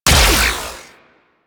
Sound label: Shot Fire; Disc Moa